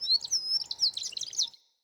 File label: Wetland Forest Day Bird Close; Midrath Island; Ambience